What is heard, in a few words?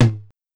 Tom Hi